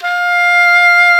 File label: English horn F4; InVision Interactive; Lightware VOL-1; Instruments & Percussions